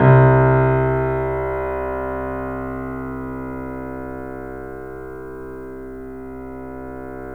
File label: The Piano; InVision Interactive